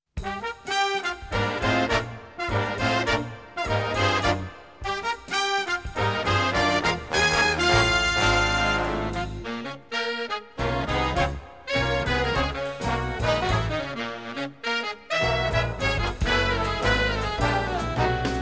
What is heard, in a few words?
Kicks and Sticks